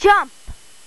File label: JUMP